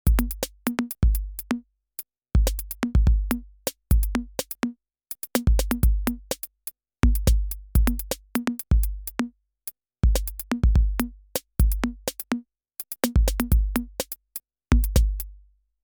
Tip Top Audio Circadian Rhythms Group Chain

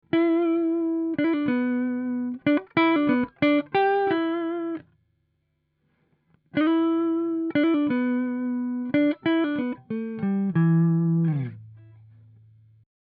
Guitar Lick Dry

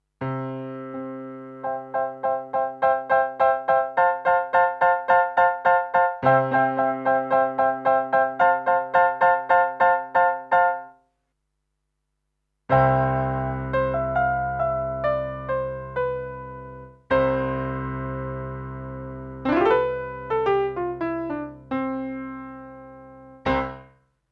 Tech 21 QStrip On Roland Piano

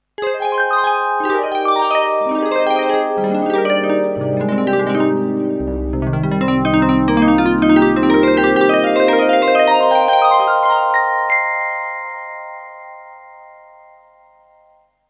TX7 Dbl Harp

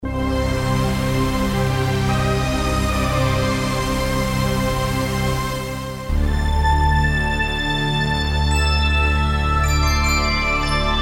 analog monster Combinators

